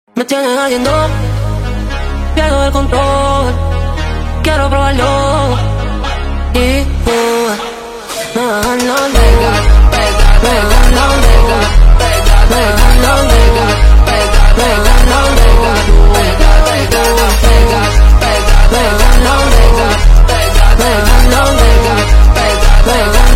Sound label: Phonk, Slowed